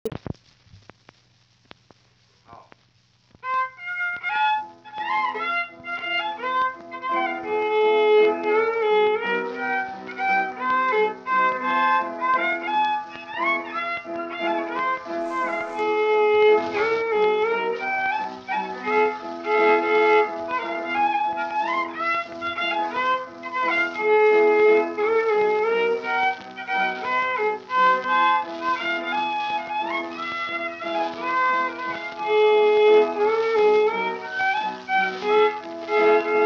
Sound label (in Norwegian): MUSIKK; amerikanorsk; einar haugen